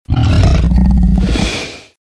Velociraptor - Growl; RadwinIsland

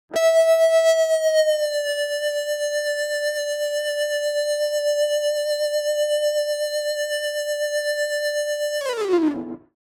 Rock Guitar Distorted - Long Sustain Tone; Hollywood Edge; sound designer's toolkit v2

